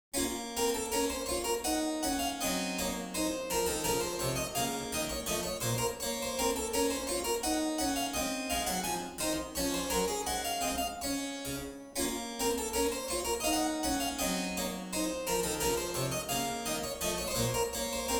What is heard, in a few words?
Suite in B minor, Gavotte, Keyboard Works; Suite No.3; BWV 814; J.S. Bach; French Suites